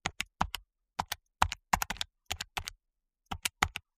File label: calculator keystrokes; calculators